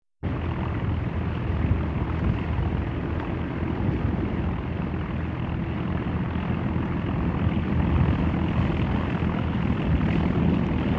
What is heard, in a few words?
Ferry slow cruise